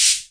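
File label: cymbal, Hammond Drum Machine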